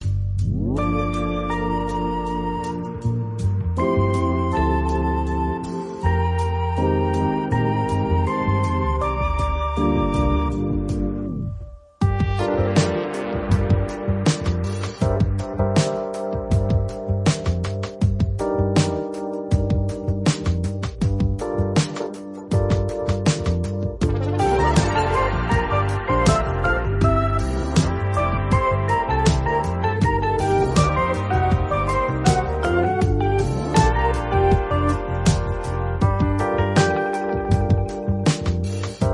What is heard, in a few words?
Couple romance jazz music